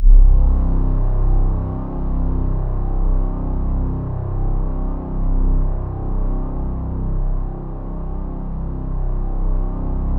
C1, Synth Leads; 90 s Sample CDs; NiceBeats (NiceBytes); Studioline 09

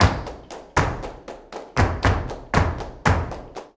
BULERIAS TAP, Flamenco

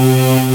Opening Screen; Ganbare Goemon 2 - Kiteretsu Shogun Magginesu!; Xexex

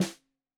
Snare 65 Reg Stereo, Deeply Sampled Drums

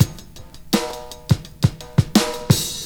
OLD SCHOOL, Breakbeats

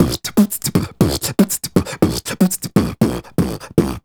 Beat Box, Hip-Hop and RnB Vocals

